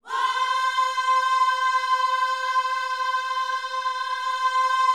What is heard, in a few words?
WHOA C 5, Vocal Planet Gospel; Spectrasonics; Vocal Planet Vol.1 Gospel; akai